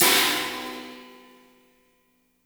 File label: CHINA, HI-HATS; Spectrasonics; Burning Grooves